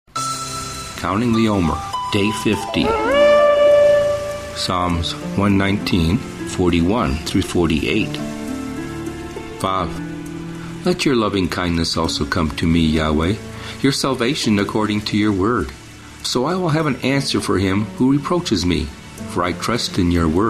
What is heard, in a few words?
Meditative w Waves Ocean; Omer; Psa119